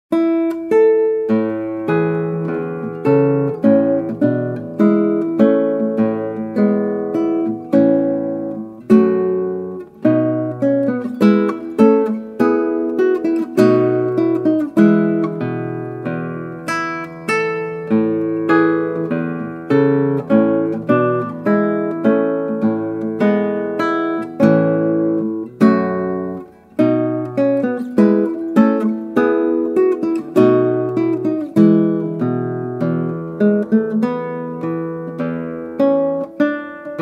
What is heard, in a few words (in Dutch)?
Klassieke Gitaar; Bach 50 Solos